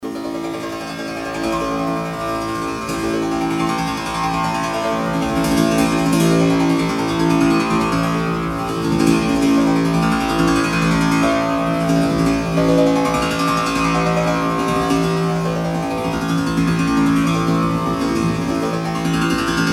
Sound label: Monochord 180cm